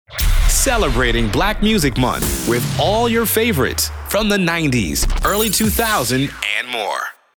Black Music Sweep

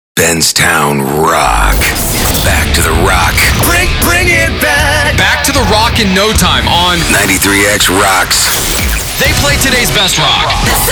Composite Rock

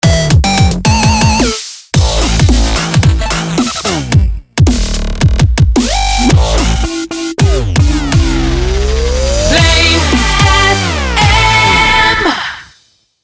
110bpm Amin MIX Ramp

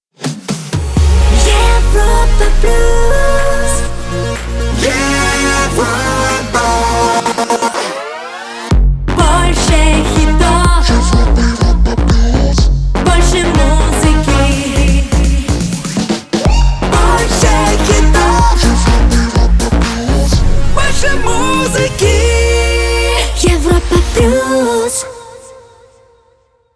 Jingle; Europa Plus

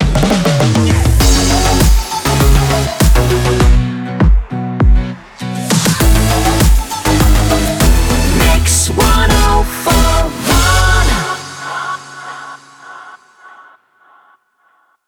100bpm f Ramp; WBMX 2017

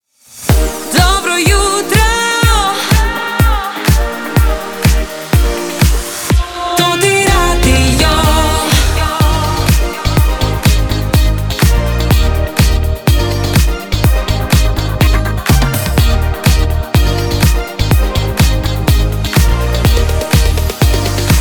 Radio Opener Morning Show; Toti Radio

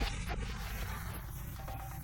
Ambience; Clarity Vx Pro

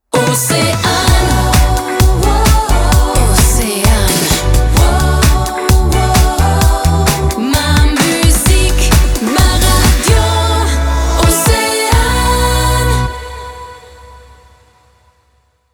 Jingle; Océane 2022

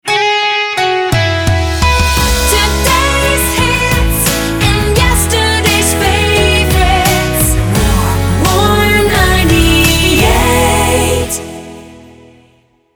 Slow 086bpm C Shotgun, jingle; Warm 98

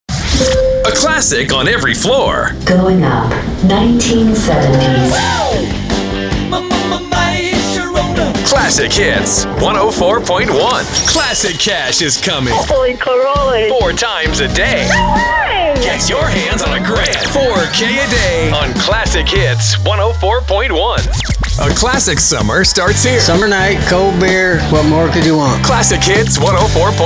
Classic Hits GOLDMINE Composite

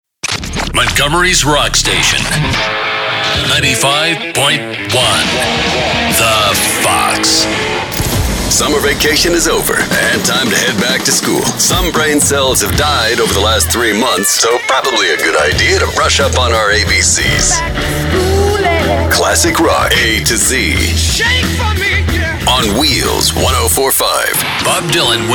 Classic Rock; Benztown

